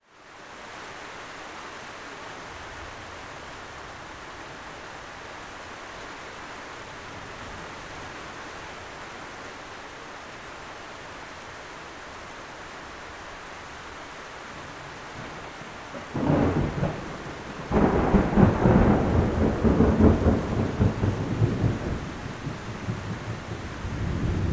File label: nature thunder close approaching light rain